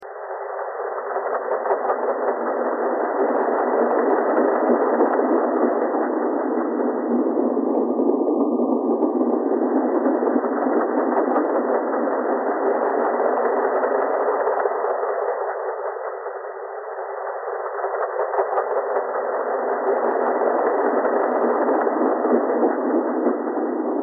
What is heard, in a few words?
thread buzz